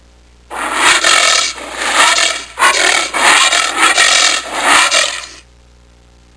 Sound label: anteater